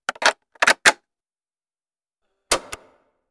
Boombox service; Zomboid; True Music; Tsarsound

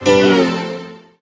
Keytar Failstate; Instruments

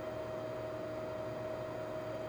jukebox running; Zomboid; Lifestyle

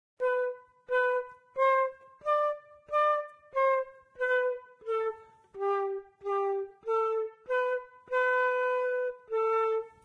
Flute; Zomboid; Lifestyle

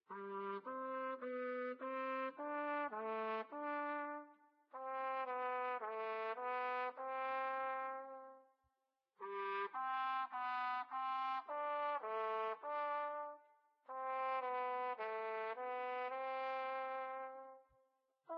Trumpet; Zomboid; Lifestyle

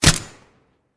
suppressed shot; Zomboid; VFE

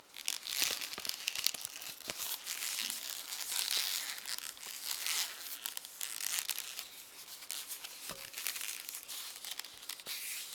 Tape Fix; Zomboid; The Workshop

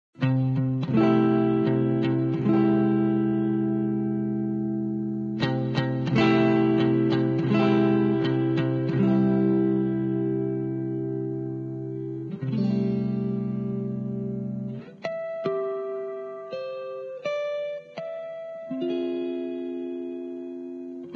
Guitar Electric

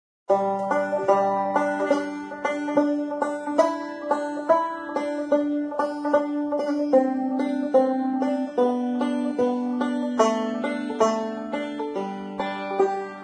Banjo Twinkle Twinkle Little Star; Instruments; Zomboid; Lifestyle

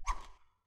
Knife Swing; Scrap Weapons; Zomboid

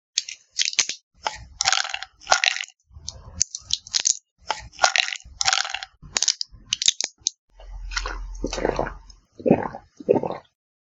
medicine cocktail; Zomboid; Zombie Cure

